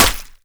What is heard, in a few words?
flesh, bullet impacts; physics; Half Life Model Pack